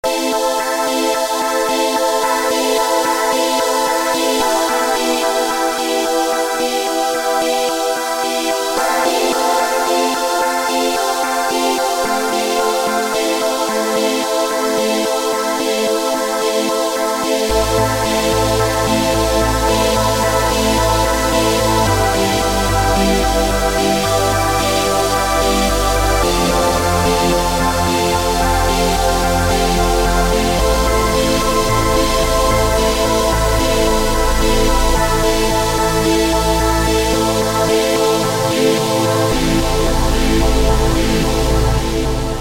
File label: TRACK N HOLD PAD; Redshift 6